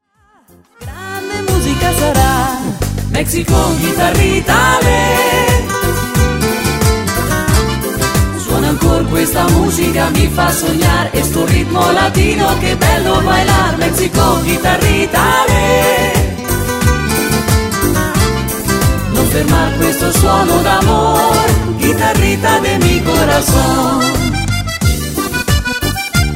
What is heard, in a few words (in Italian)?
guitarrita